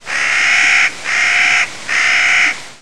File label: Clarks Nutcracker Bird